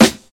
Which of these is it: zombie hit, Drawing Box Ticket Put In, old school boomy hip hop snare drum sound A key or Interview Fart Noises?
old school boomy hip hop snare drum sound A key